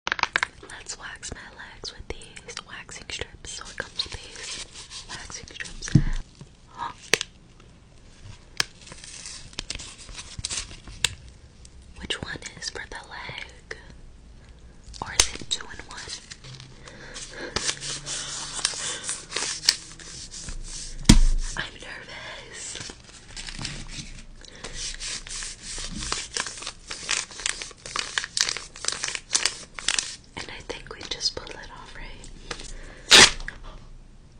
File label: wax; کندن وکس